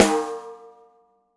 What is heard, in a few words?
snare, gretsch kit